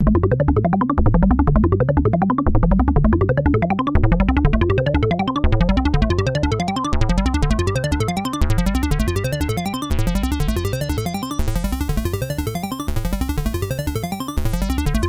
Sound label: MICROKORG; Puzzle Arps